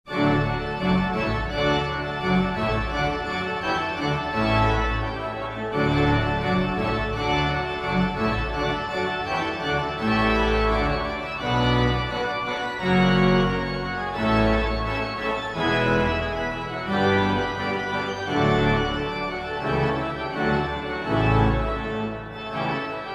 Fanfare; Lemmens

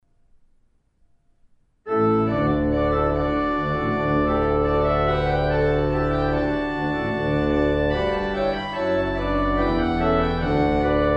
trumpet tune; callahan

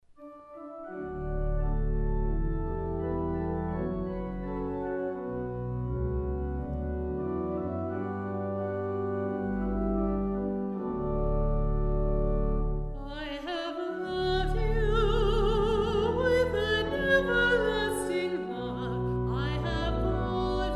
I have loved you vocal version

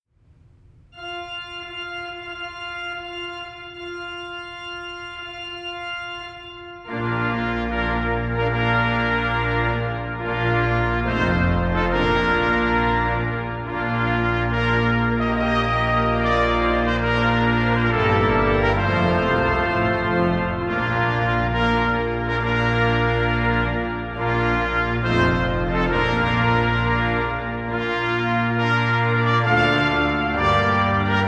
Bridal chorus

